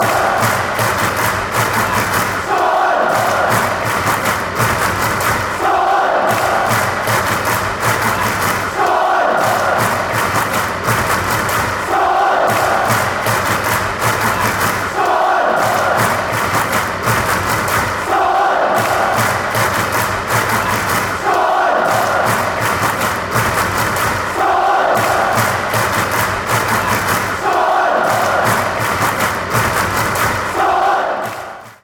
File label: scotland clap; scotland, chants